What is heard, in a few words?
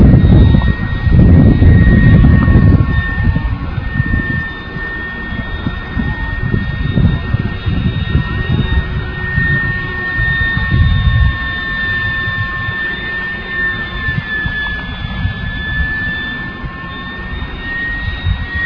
f-16 flight